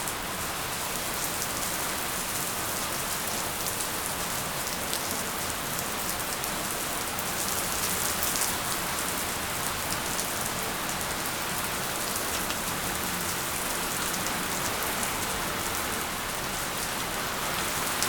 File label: Rain